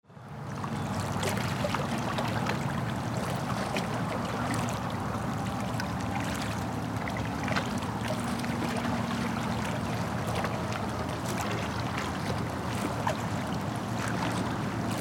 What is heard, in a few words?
waves